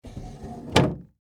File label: Dresser Drawer Close; Foley; Drawers; Household